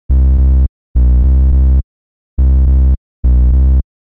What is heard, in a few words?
Hip Hop Bass 105